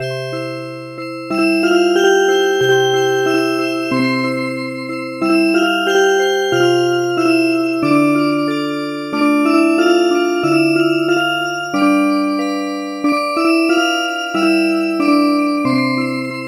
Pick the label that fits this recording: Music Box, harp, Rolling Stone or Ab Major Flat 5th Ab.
Music Box